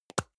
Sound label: deo can lid open hard